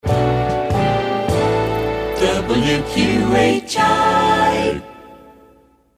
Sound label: Jingle; WQHI 1975